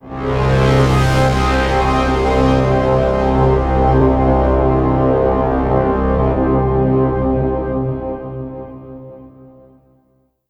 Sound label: Dramatic Sci-Fi